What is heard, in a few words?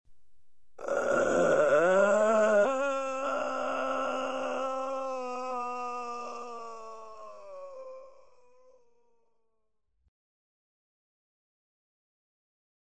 zombie moan